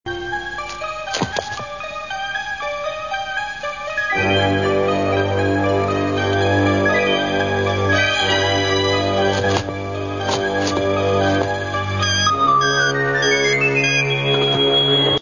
rgb music

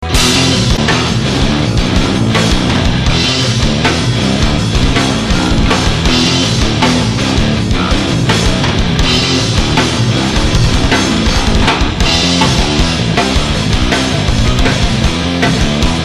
Strange Rooms Loop

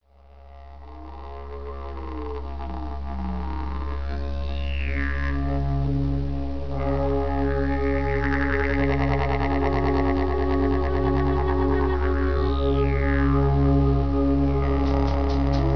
didg

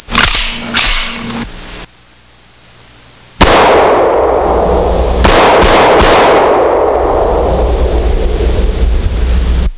45 cal, gunshots

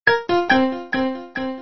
piano

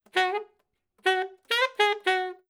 sax riff

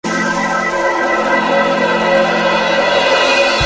melody

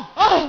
hit; 夏洛特聲音資料庫

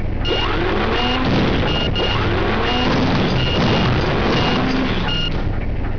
slam; Twisted Metal 2

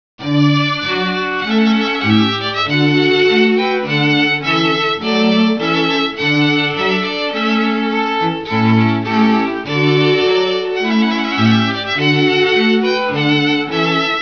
trumpet

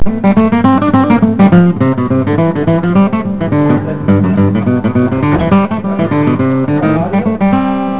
Picking Guitar; Mike's guitar; Easter Sunday, at our family get-together

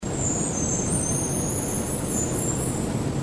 Formosan Striped Squirrel; Tamiops maritimus; call; Bird Call Recordings

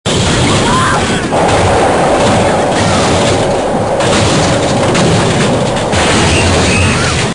pound, Predator pounds on the subway car.

